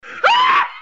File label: screen tone tone tone, maniac laugh